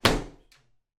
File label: household shower cubical glass door close